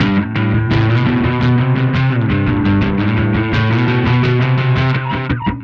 Static Bass; Sonic Foundry (Sony Creative Software); Indie Rock Anthems; Session 13 - Static

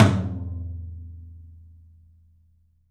open tom; 3D Sound; 3D Drums; 13 TOM

